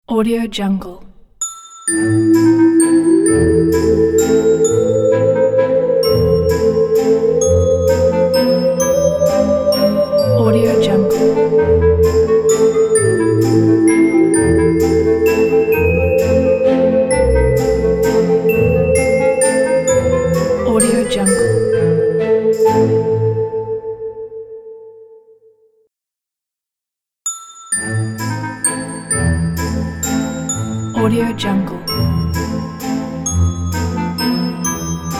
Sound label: halloween spooky logo